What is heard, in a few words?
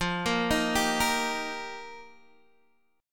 F 7th Suspended 2nd Suspended 4th, Guitar Open G